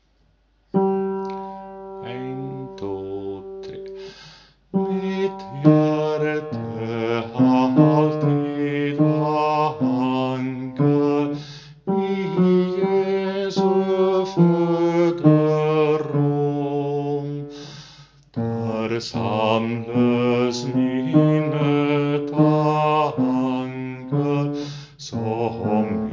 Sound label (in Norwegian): mitt hjerte basser